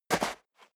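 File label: step dress boots sand single right, footsteps; schritte; menschen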